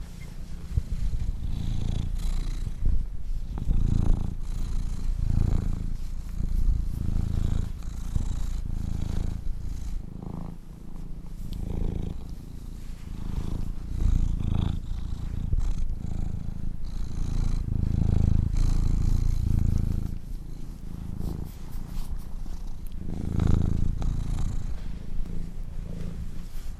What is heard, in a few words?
purring cat; OpenValley